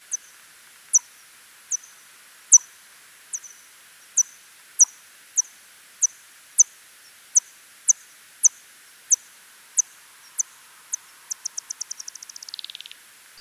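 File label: Pipistrellus pygmaeus, bat; Boehm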